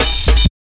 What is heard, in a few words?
Klanga Cut, drum loops 165.5; Zero-G Jungle Warfare 1, 2 & 3; 90 s Sample CDs